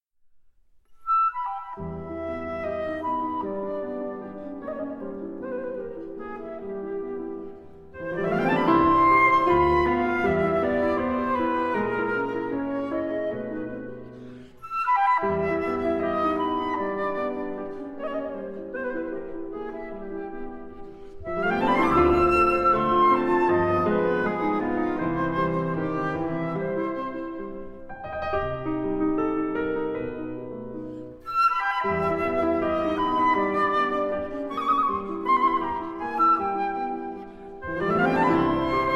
Sonate Pour Flûte et Piano, Allegro Malinconico

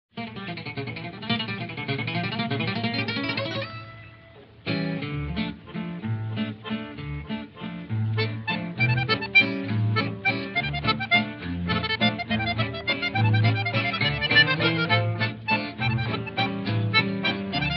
Orchestre Musette

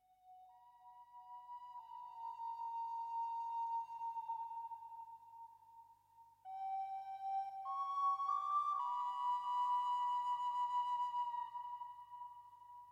Concerto en Mi Mineur Instrumentation Contemporaine

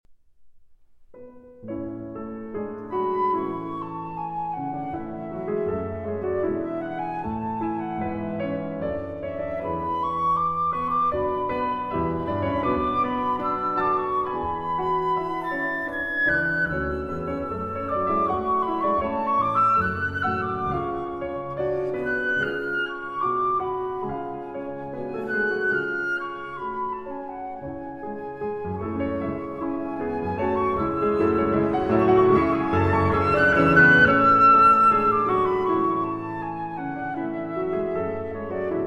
Flûte et Piano; Sonate Pour Violon et Piano en La Majeur, FWV. 8 (Arrangée Pour Flûte et Piano); IV. Allegretto

